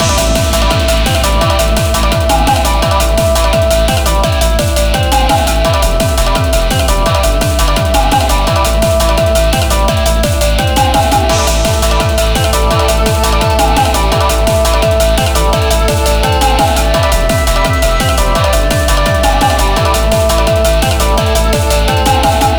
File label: Battle Fever; Doggie Ninja The Golden Mission